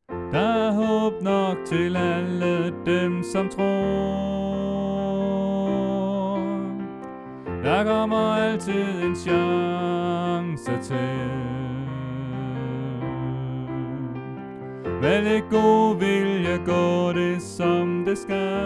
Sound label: Sopran1; Kom og se far danser; oevefiler